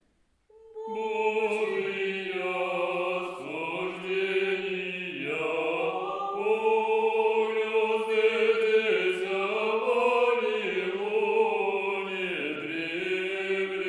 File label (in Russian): ирмосы 8 глас 7 песнь